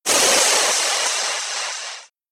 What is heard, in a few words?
whoosh; Whooshes and sweeps